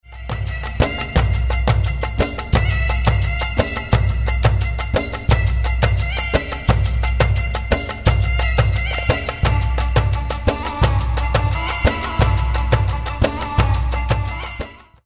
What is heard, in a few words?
Rhythm Saudi